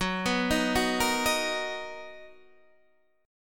B Major 7th F sharp, chord

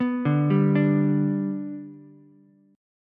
G Major G down Ukulele; G arpeggiated; JGuitar

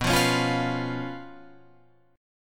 B Suspended 2nd Flat 5th, Guitar, Open F; Bsus2b5 strummed; 11,12,13,12,14,12; JGuitar

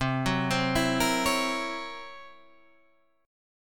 C 7th Flat 5th Flat 9th C, chord

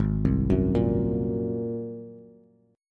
Ab 9th Ab down Bass; Ab9 arpeggiated; JGuitar

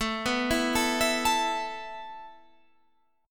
E Suspended 4th A

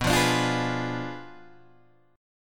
B Diminished 7th B down Guitar Open G

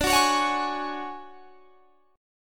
D Major Sharp 11th D, chord; DM#11 strummed

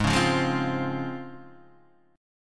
Db Major 7th Ab

